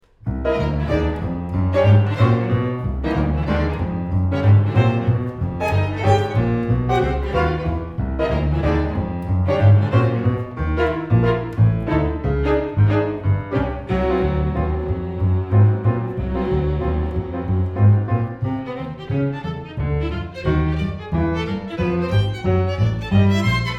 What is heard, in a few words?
Bebop